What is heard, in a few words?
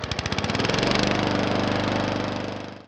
lawnmower